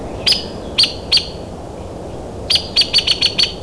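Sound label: hairy woodpecker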